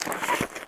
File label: Drag Dirt